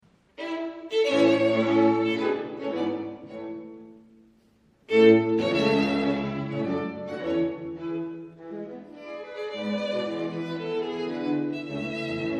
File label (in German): Streichquartett a moll; Beethoven; Op.132